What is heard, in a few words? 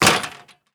Metal Destroyed; Angry Birds Rio